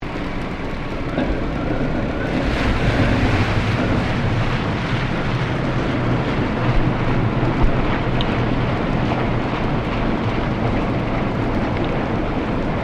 Boat Ferry Freshwater Class Engine Maneuvering Submerged; Sydney; Manly Ferry; Boats; Vehicles